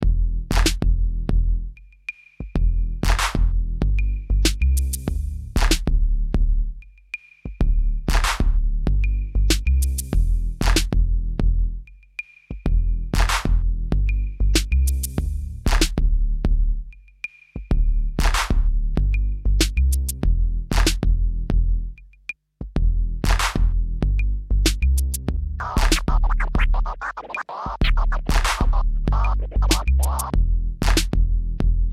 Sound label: Rhythm; PixelJunk Shooter 2; ps3